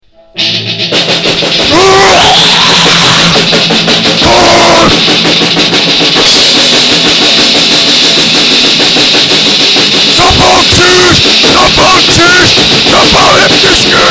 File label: grindcore